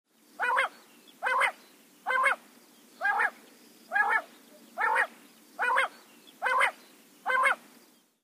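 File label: quail voice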